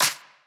Impact Clap

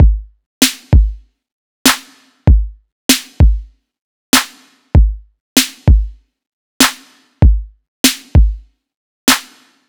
drum loop, 97; mixready late oh eight stripped

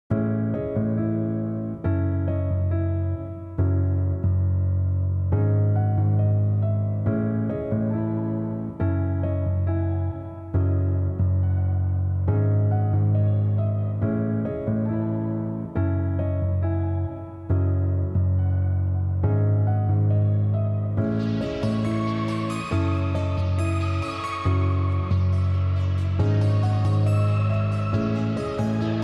Piano; Platinum Sessions

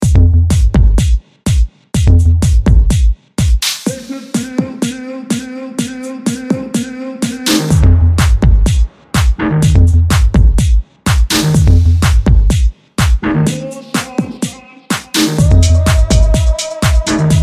Analog Tech Patterns

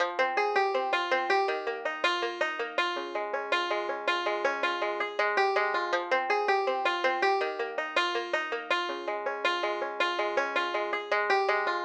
banjo Fmn 162bpm

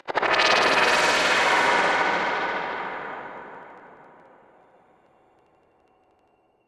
fx stutter down

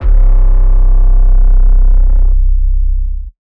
body (808)

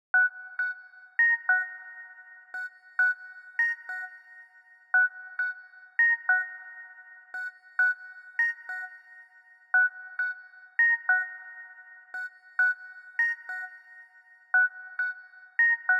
High keys 100 F-

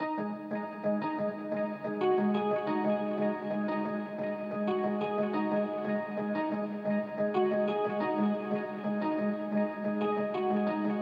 Piano 90bpm

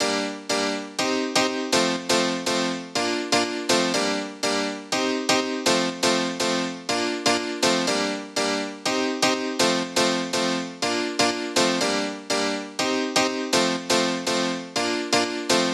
Piano G 122

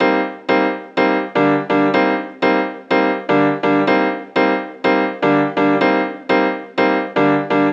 Piano Loop 124 Dm Old Skool Piano Loop

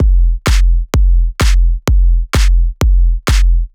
128 Drums Kick Snare

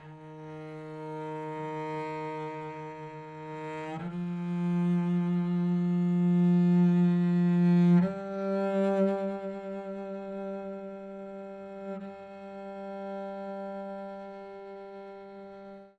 Cello, 120bpm, D, dark horror

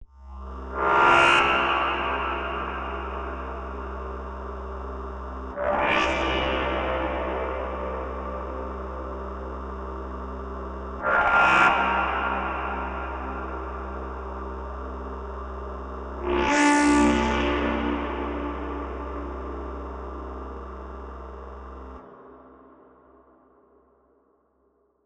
OMINOUS AMBIENCE D 175; KULTURE

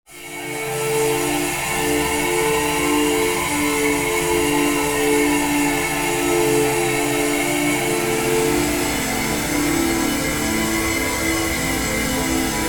Atmospho

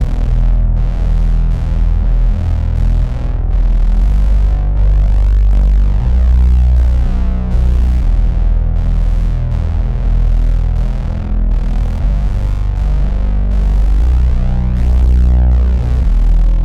bass drone boxy, Emin, 120

